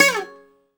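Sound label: bouzouki glissando down C 3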